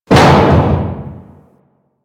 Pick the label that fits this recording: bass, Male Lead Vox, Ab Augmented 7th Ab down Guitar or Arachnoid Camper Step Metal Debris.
Arachnoid Camper Step Metal Debris